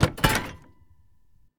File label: metal sign deploy; Rust